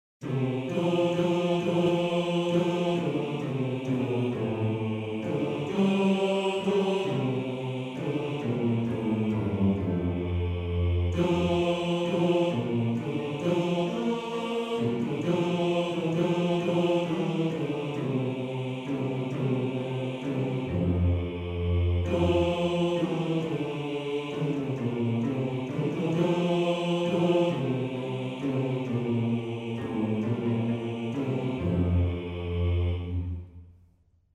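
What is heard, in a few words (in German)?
Bass 2, Männerchor; Heimetvogel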